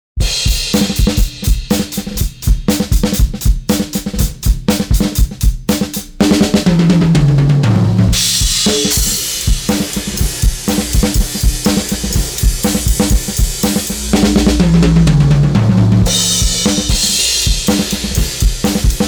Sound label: drums